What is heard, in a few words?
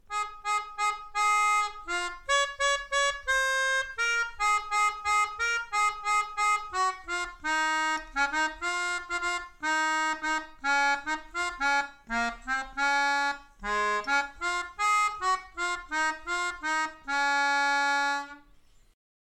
Melodica